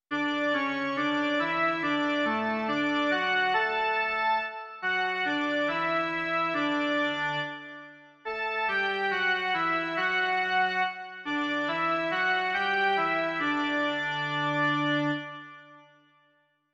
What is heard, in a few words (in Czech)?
jen melodie, Doprovody liturgie; Požehnaný; Doprovody Farského liturgie